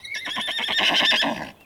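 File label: HORSE WHINNY; ANIMAL FX; Greytsounds VOL-1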